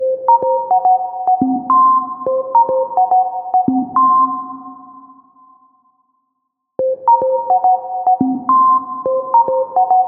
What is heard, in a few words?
Minimal